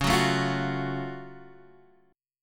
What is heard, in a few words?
Gb Minor Major 7th Db